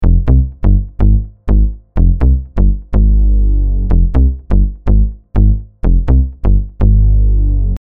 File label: Deep House Bass